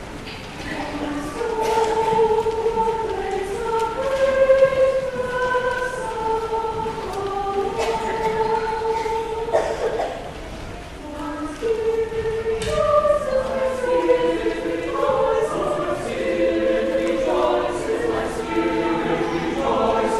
Choral

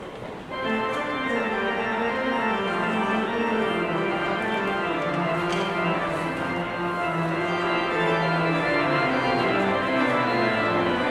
Postlude

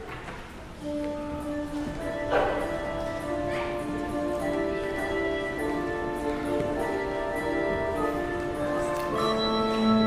Handbells; Thy Strong Word; Behnke

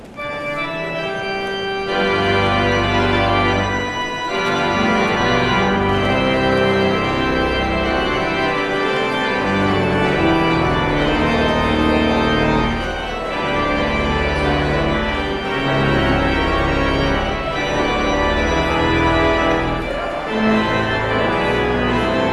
Postlude

